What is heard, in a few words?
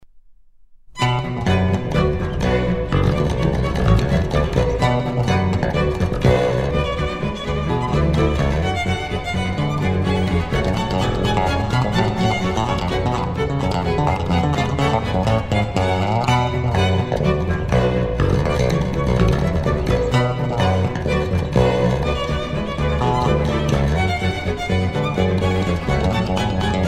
Badinerie Arr. For Electric Bass And Stri; Overture Suite No. 2 In B Minor BWV 1067 VII.